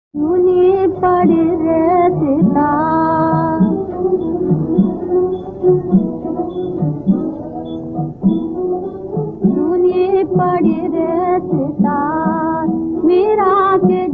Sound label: Sitar; Kangan